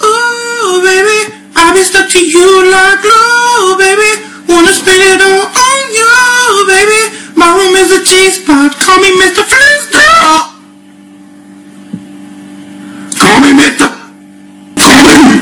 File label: voicecrack ahh singing